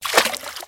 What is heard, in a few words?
bad roll; Dice World